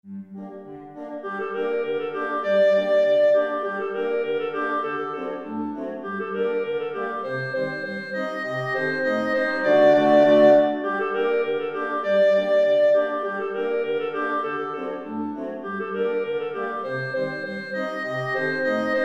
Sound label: hanukkah